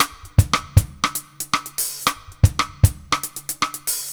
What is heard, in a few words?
Drums, Jazz